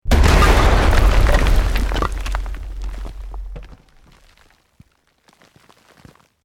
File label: EXPLOSION MEDIUM STONE ROCK